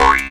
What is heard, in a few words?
C# JAW, Primitive Instruments